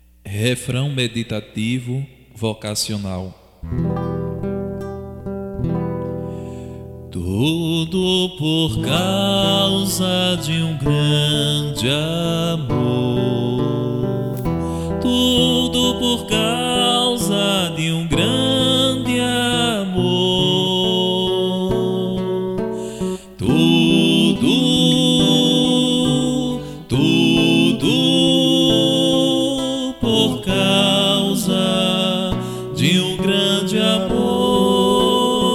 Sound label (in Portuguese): refrão meditativo vocacional